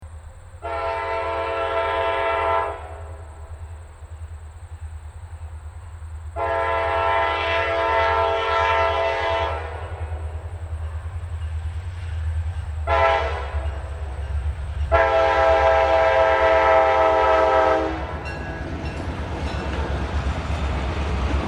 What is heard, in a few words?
horns; csx k5la